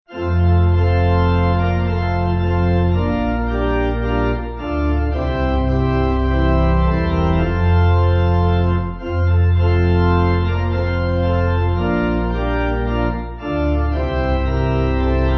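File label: Pipe, Pipe Organ; T.C. O'Kane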